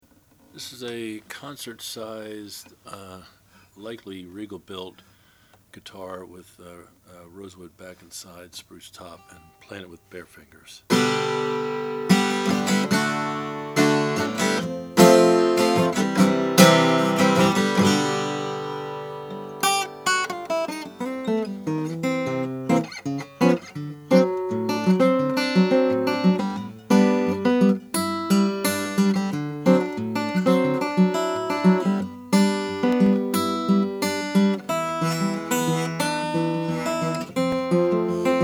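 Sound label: regal concert size natural, Guitars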